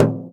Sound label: FRAME, INDIAN PERC